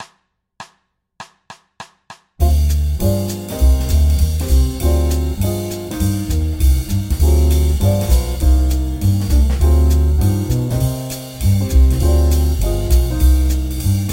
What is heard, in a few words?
Dm7b5 G7 Cm7 (2bars Loop) 200